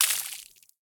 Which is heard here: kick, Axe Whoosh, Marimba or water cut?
water cut